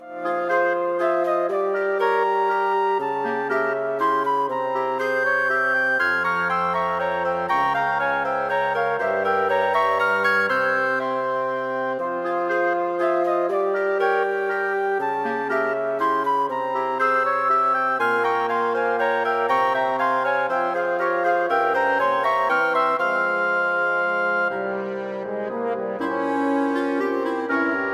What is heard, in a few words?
Wind Quintet, This piece starts with a flourish